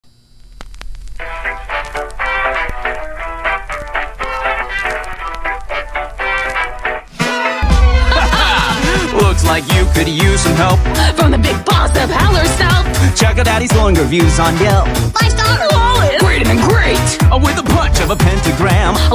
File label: male female mashup; hazbin hotel